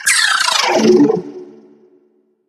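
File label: die, alien controller; scmod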